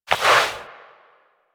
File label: Crouch Move Foley; Warframe; Tenno